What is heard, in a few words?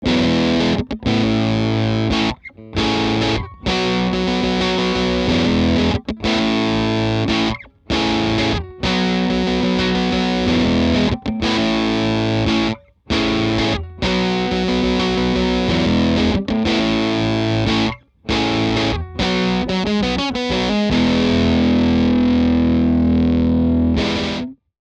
FUZZ; Jam Master JM 1; OSSC Jam Master JM-1 set of 2 pickups